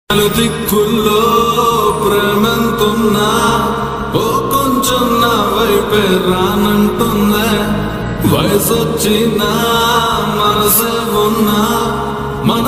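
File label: telugu new sad trending song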